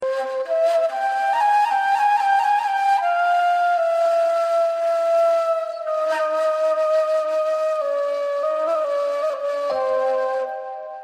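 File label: Nay Message Tone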